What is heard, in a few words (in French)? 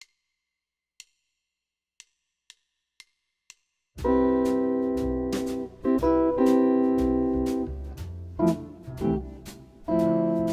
progress 4 6 enrich chromatique, guitare jazz